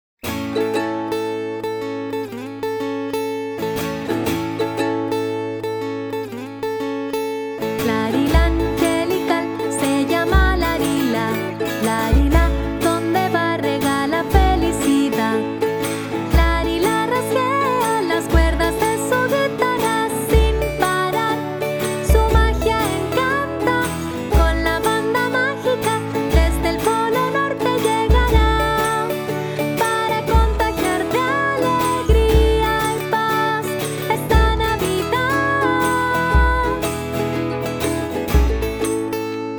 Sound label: music